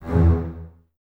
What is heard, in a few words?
Basses E 2, String Ensembles; Miroslav Vitous; LINKS ORCH